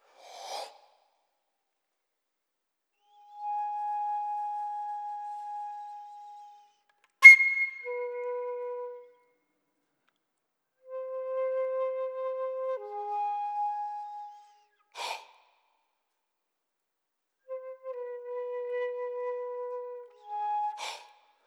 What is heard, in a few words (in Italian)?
Flauto